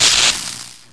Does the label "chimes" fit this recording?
No